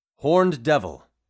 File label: horned devil; bestiary; 5etools